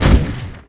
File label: Small Cannon; warzone